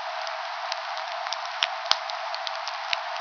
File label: rice skim, crackling; SimScience